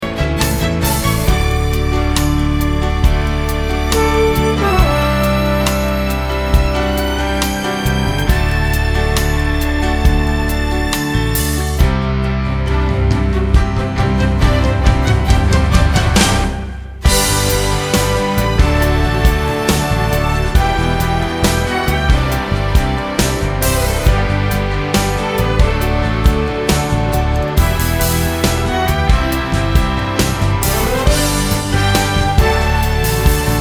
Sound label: accompaniment